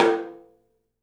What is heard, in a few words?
tom, studio toms; Percussives; 3D Percussives